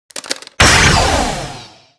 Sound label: Bomb Dispel; Seer; GamigoNA